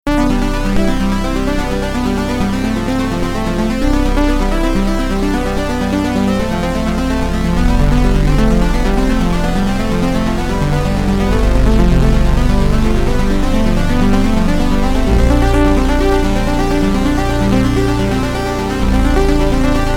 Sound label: REV2